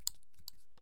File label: mayo draw; Escape from Tarkov